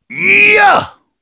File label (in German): Heavy cheers, de; Jaaaaah!; Sprachbefehle vom Heavy